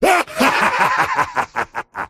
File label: dismember laugh; dota; pudge